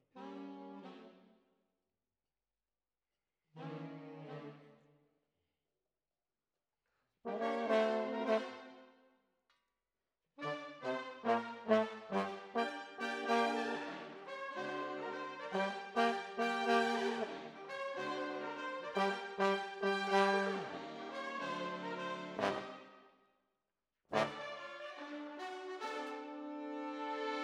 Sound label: Trombones, jazz for piano